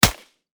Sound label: vss shoot, weapon; metro